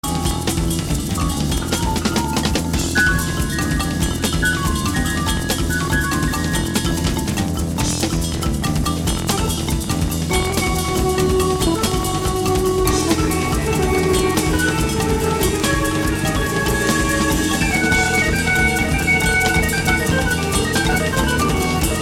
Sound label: solo, hammond; Gilbert Cesbron; autres temps autres mœurs : le b3 dans un contexte qu'on a pas toujours l'habitude de trouver.